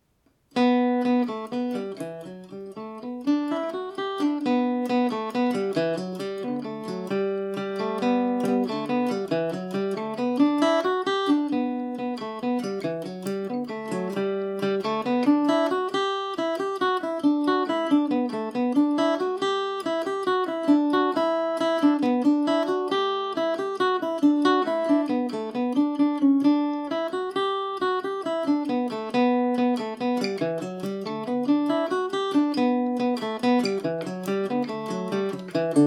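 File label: E dorian, Irish mandolin; morning star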